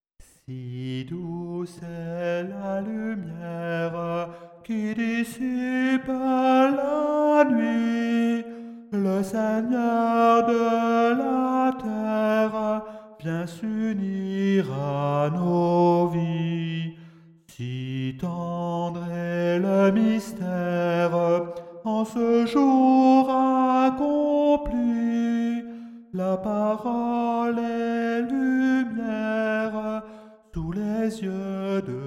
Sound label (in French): noel tenor